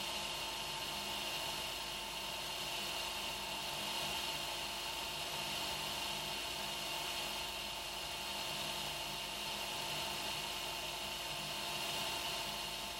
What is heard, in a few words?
pump100, aio; FSP AE36